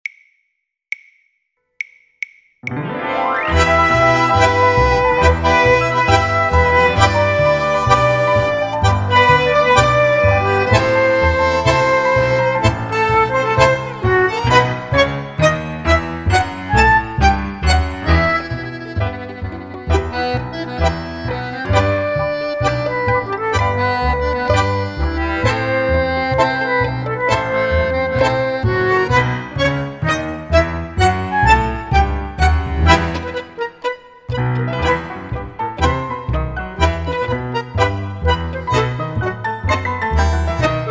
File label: flute